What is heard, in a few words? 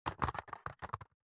house doors